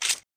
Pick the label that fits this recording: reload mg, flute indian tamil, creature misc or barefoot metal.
creature misc